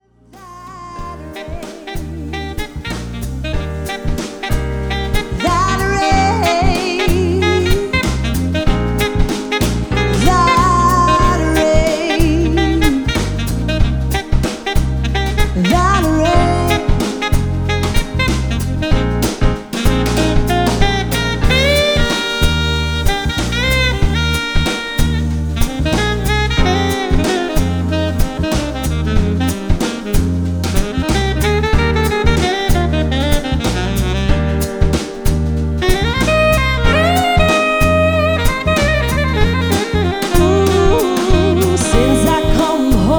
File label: plus sax